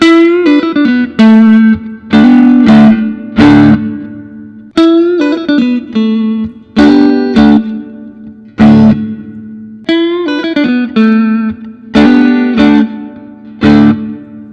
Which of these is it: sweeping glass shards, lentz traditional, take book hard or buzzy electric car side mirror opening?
lentz traditional